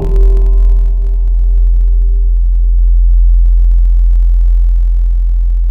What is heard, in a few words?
Real Celeste; wavetables; Instruments; Synthetic's Serum Collection; ESW Core Wavetables; Echo Sound Works Core Tables